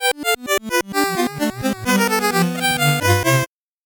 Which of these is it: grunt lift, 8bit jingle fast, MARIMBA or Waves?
8bit jingle fast